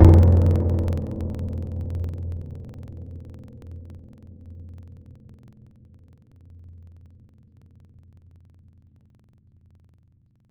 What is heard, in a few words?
Gamelon; Synthetic's Serum Collection; Echo Sound Works Sphere